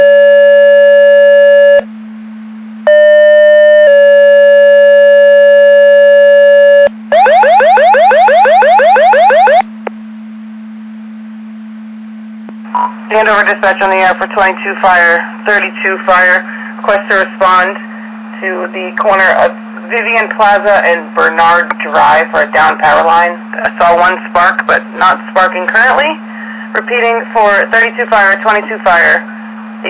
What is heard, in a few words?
Siren; Andover Twp Boro